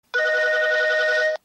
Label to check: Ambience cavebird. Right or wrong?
Wrong